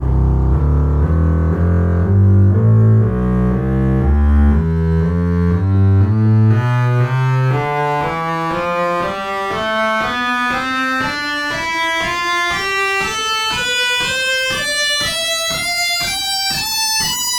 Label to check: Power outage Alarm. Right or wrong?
Wrong